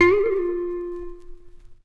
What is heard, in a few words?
Polaris Organ Vinyl Synths F3; Polaris Snake Organ; vinyl leads